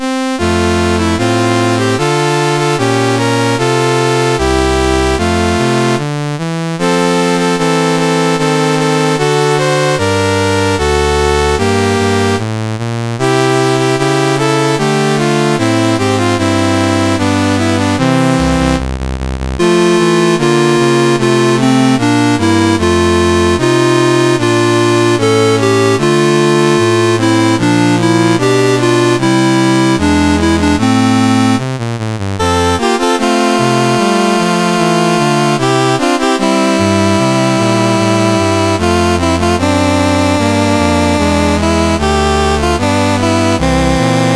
CSG8580R5, National Anthems; Rwanda

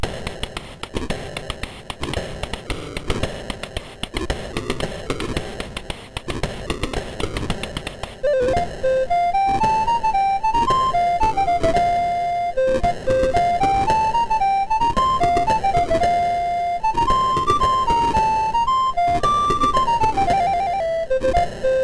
British Grenadiers, MOS6581R4

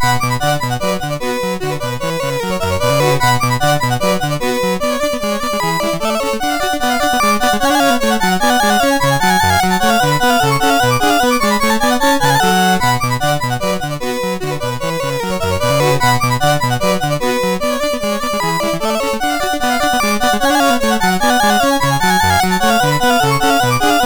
Badinerie, MOS6581R3

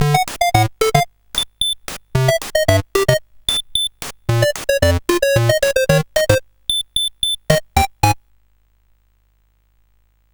sid, CSG8580R5; Coco-Notes

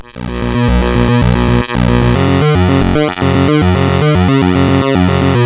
sid CSG8580R5; Dungeons of Doom

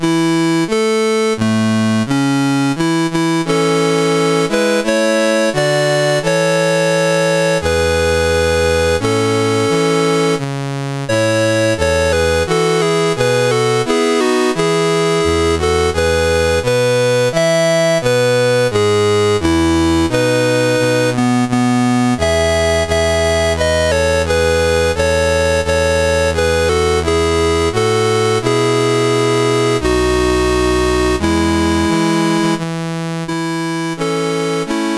Denmark, National Anthems, CSG8580R5

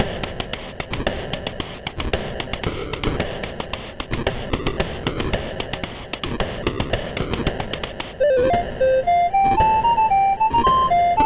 British Grenadiers, CSG8580R5

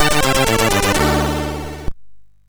Intro Music, MOS6581R2; XS Intro Music

